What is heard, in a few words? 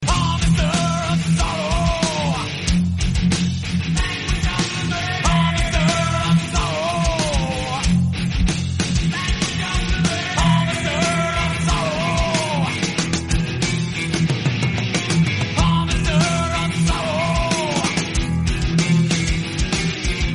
Heavy Metal